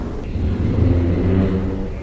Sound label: call, giraffe; zoochosis pack1